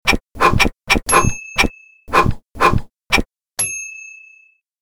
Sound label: karate fight